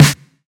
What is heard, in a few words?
boom steel snare drum sound d key